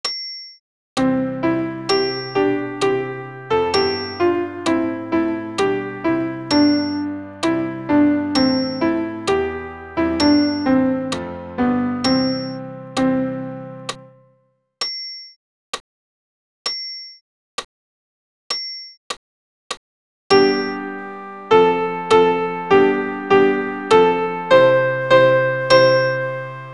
Credo sop pian acc

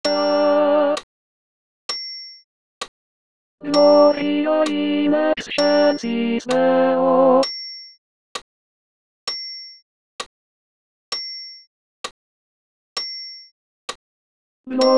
Gloria ten voc acc

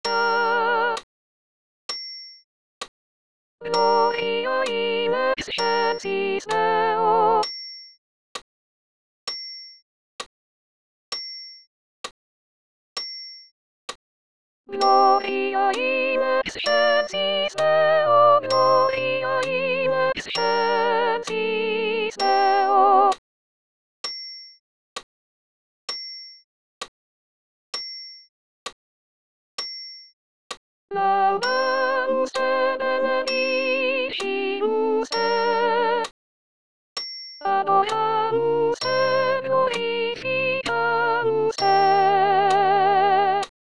Gloria sop voc acc

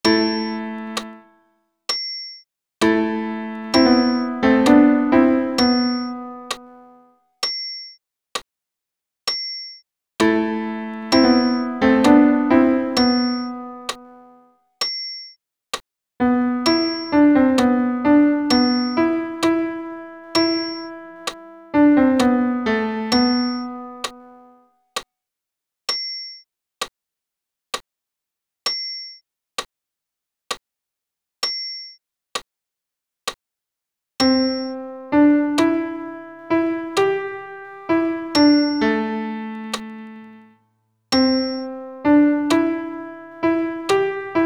Agnus ten pian seul

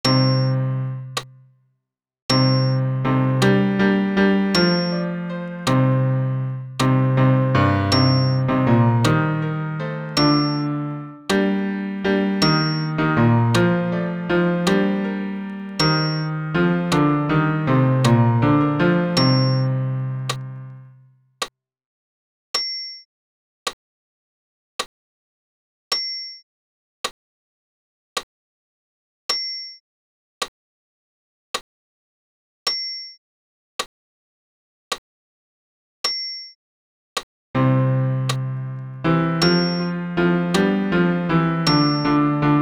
Credo bas pian acc

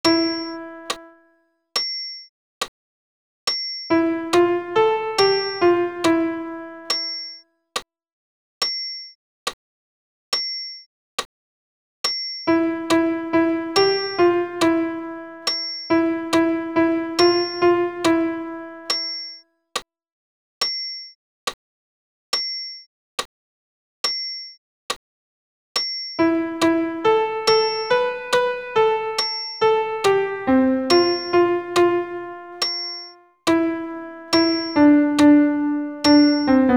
Ite missa est alt pian seul

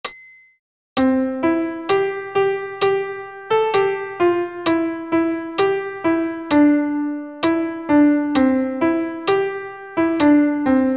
Credo sop pian seul

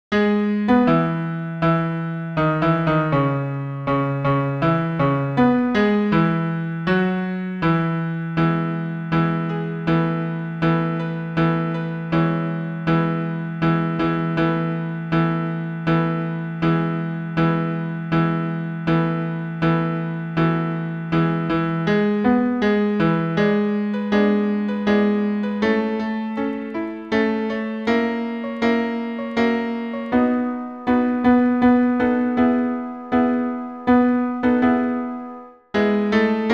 bas pian acc

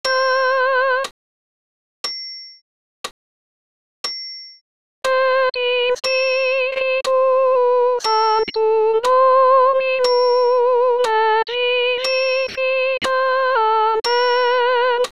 Et in spiritum sop voc seul

